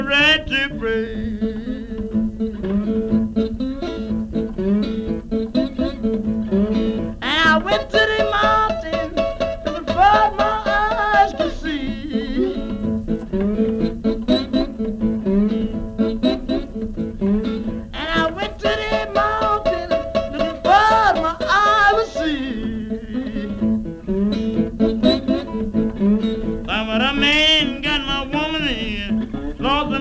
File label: blues